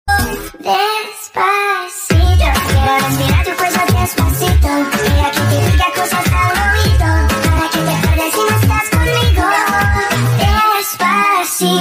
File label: Cartoon Funny Ringtone